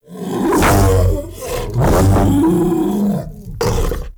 Ancient Tank Pain, Enemies, Infested, Barks; Warframe

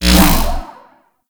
Kick Punch Whoosh; Warframe; Weapons; Corpus

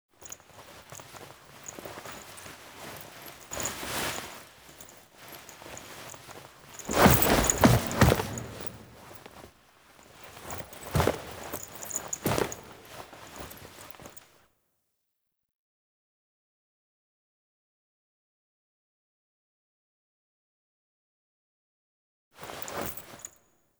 Foley; Cinematics; Lotus Gem Part One; Drifter

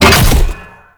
Thumper Bot Jump; Warframe; Grineer; Movement; Steps

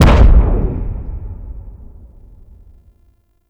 Far Step Default; Warframe; Corpus; Arachnoid Hunger; Steps

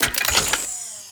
Shotgun Fire Mech; Kuva Sobek; Double Barrel Shotgun

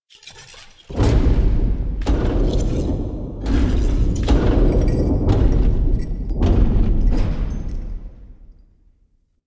Movement B; The New War; Erra; Cinematics

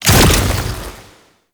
Shield Activate; Warframe; Nineteeen Ninety Nine; PvPvE

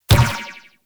Ball Bounce Dirt; Warframe; Tenno Ball; Missions

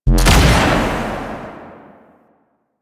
Alt Shot, Blastor Pistol; Warframe; Weapons; Corpus; Plinx

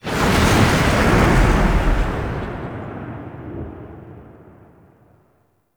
Diorama Whoosh Out; Warframe; Tau; Tennocon Relay

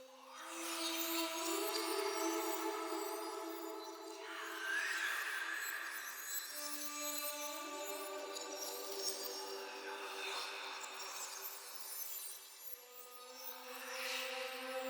Armour Plating Active Loop; Powers; Bromius

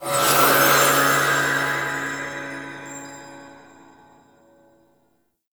Conversation Stinger; Edens Ultimatum; Itzam

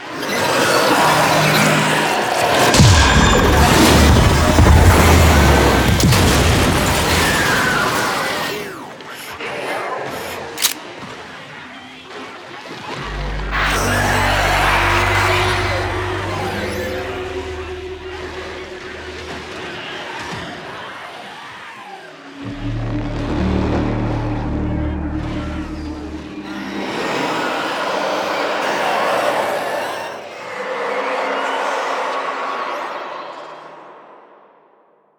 Hive Queen Cin Good End Sfx; Warframe; Nineteen Ninety Nine; Cinematics

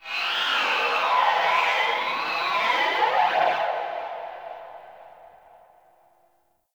Energy Wind Texture Med, Corpus Ship ambience; Textures

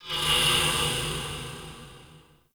Ship Metal One Off; Warframe; Corpus Ship Remaster

